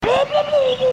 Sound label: wub